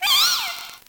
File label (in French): Cri